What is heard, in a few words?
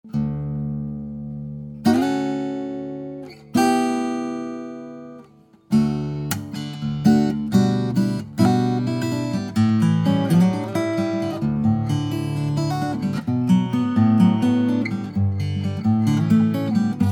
Guitar